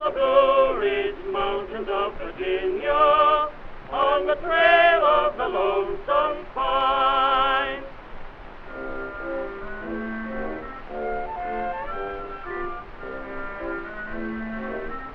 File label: tenor and chorus with orchestra